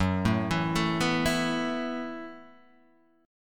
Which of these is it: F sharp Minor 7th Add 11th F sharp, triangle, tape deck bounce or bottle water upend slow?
F sharp Minor 7th Add 11th F sharp